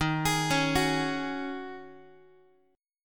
Eb Minor 11th Eb down Guitar 4A,4E,5A,5D,5G sharp,6C sharp; Ebm11 arpeggiated; JGuitar